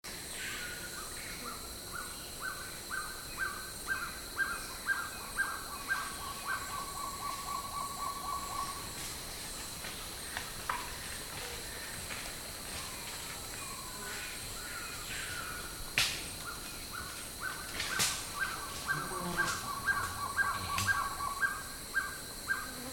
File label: Guianan trogon